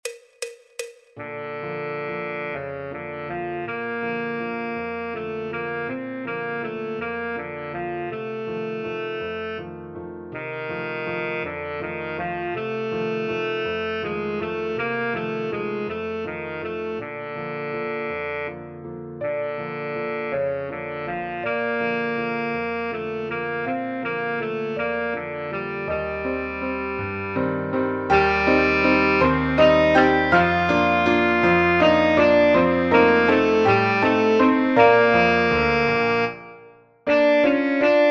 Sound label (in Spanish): Saxofón Tenor y Soprano Do Mayor